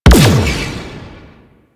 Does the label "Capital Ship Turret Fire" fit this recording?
Yes